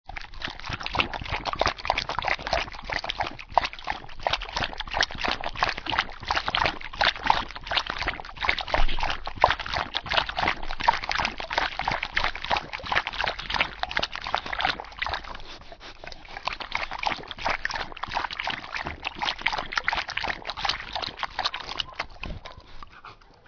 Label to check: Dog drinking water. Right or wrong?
Right